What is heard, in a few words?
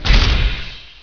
menu